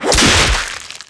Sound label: whip critical hit; DAoC